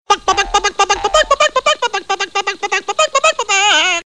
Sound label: chicken in mooring; animals